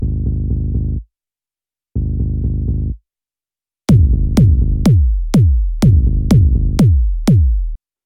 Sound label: beatprogramming